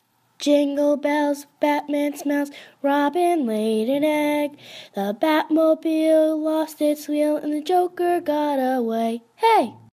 jingle bells batman smells

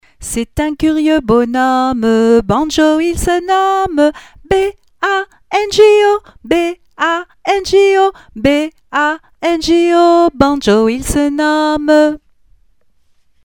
banjo

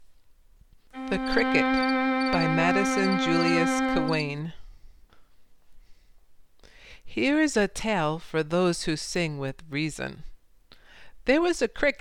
the cricket with violin; cawein

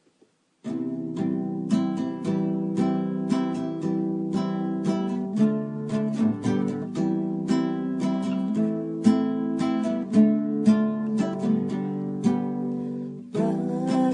rock a bye baby voice acoustic

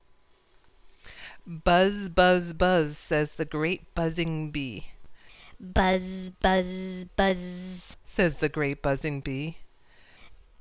buzz buzz buzz

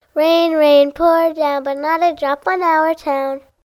rain

